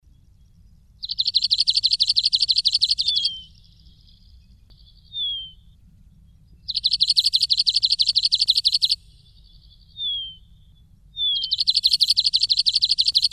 long tailed widowbird

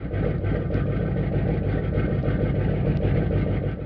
nautical

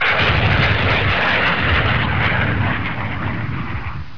aircraft

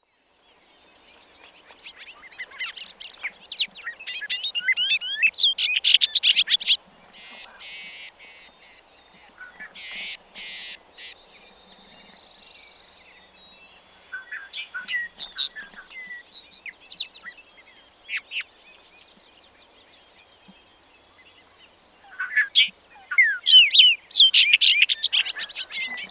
bobolink

